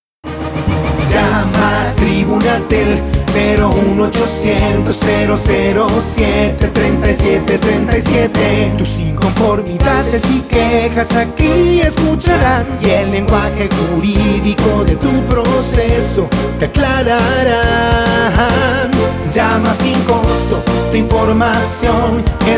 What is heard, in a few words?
JINGLES